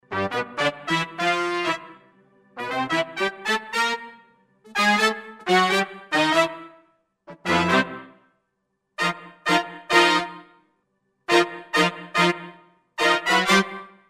Session Brass, Roland SR-JV80-09

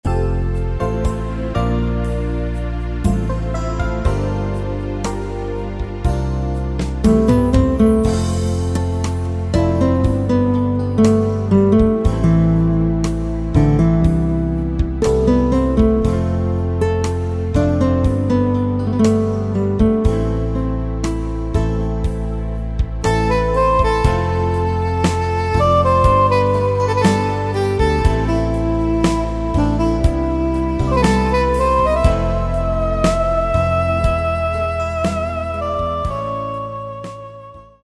EP Ballad, Yamaha PSR-3000; Factory Styles

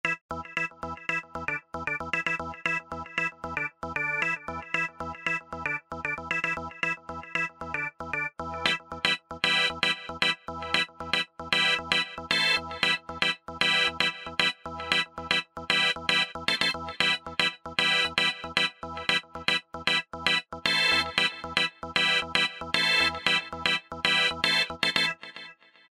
CLICKY ORGAN; Best Service Alpha Dance II

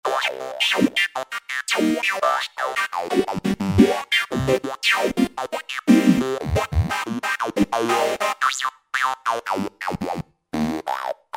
Dope Reso; Roland SR-JV80-09 Session; Dope Resoclv

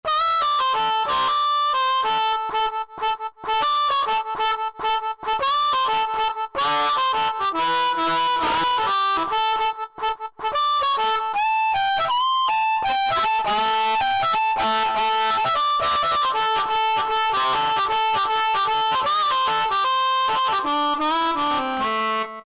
Blues Harp, Korg 05RW

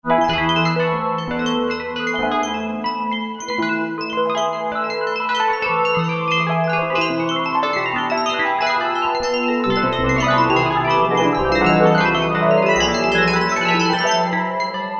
SE Xpander, Yamaha TG77; Factory Presets

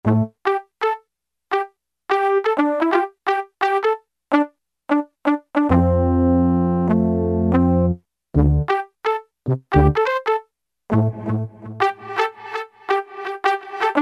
Reverse Regen 2 sec

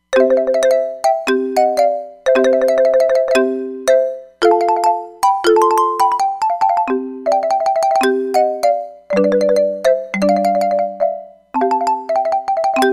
Marimba, Roland Fantom XR

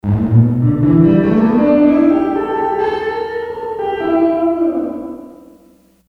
Tom Verb, Sony HR-GP5

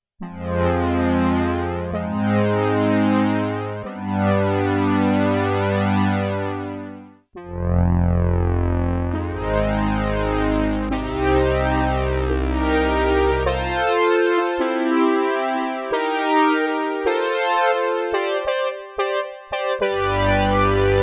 ENSEMBLE & BRASS, SIEL DK 80; Factory

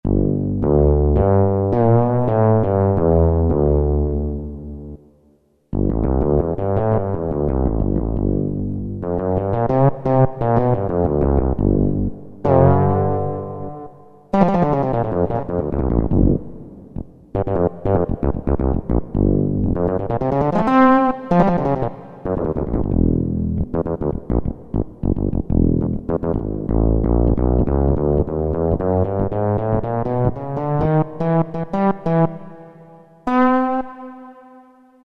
No Frets, Kurzweil K2000; Kurzweil K2000 V3

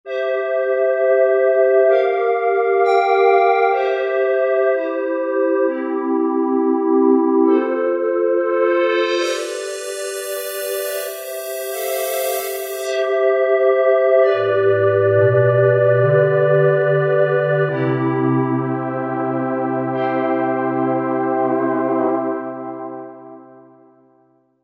Polly Morph, Roland V-Synth

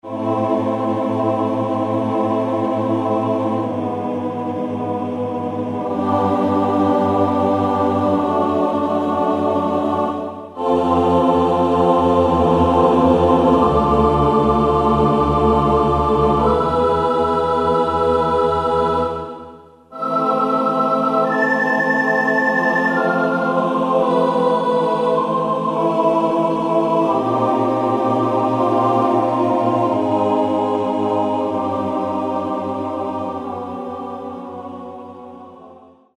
Choir, Roland U-110; Factory Patch

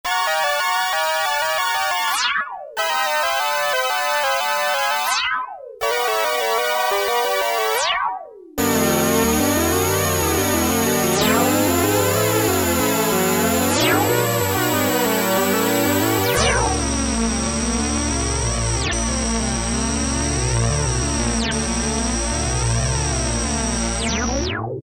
Alien Synthesizer, Roland JX-3P

